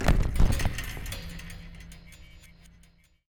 Colide

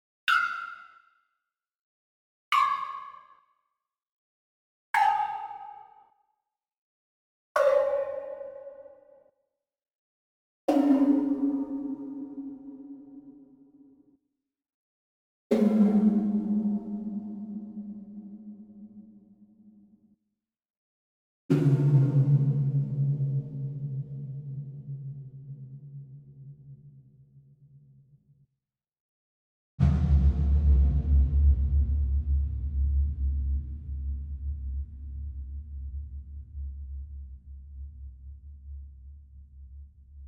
Like Flicking A Tin Can

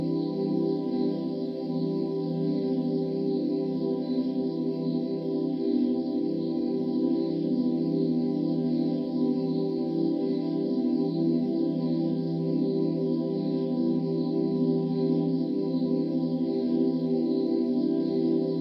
Darkblue Synth Pad Egce Chord

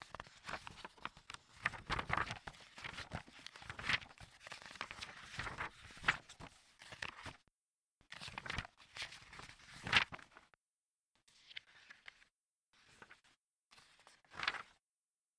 Looking In Book

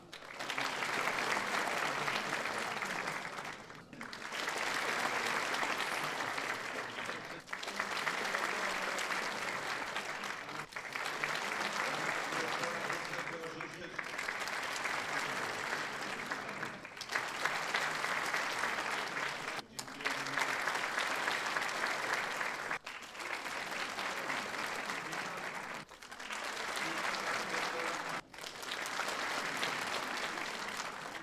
Short Applauses Different Takes

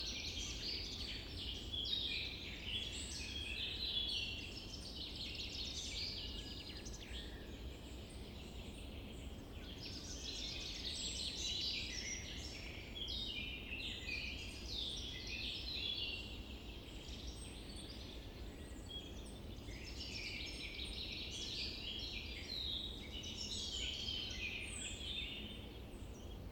Forest Summer

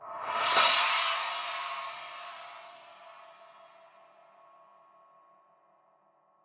Cymbal Roll

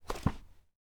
Book Close

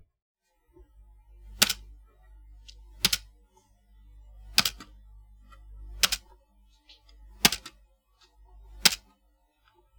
Tapping Spacebar

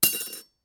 Metal Drop

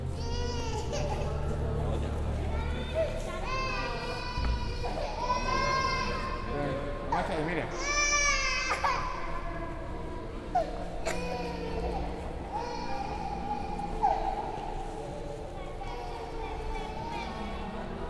Children Hall

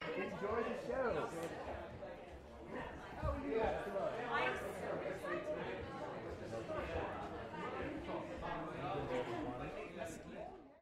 Audience Preshow

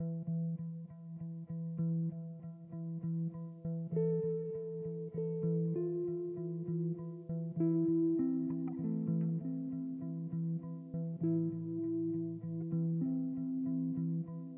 Electric Guitar Loop For Background Music

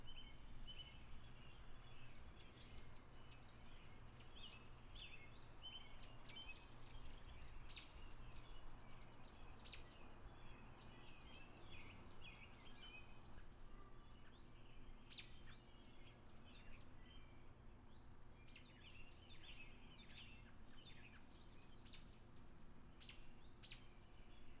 Ambiance Suburbian Forest

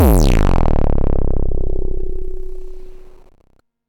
Clean Dirty Hit

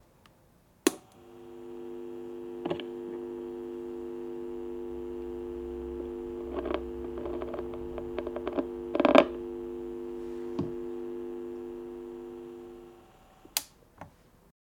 Vinyl

